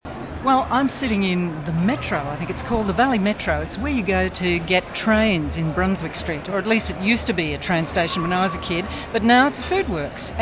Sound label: new metro